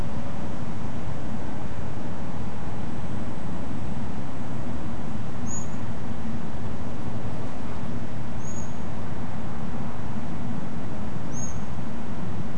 unid hooked call 6.5kHz 3 calls, nocturnal flight call; nocturnal flight call project in beijing autumn 2021